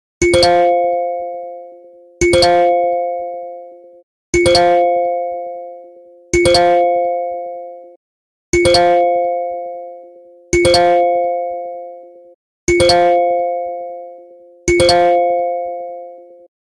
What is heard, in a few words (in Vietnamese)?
am thanh no cuoc; grabwin grabfood grabexpress